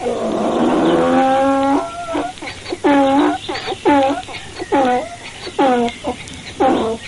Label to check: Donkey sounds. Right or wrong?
Right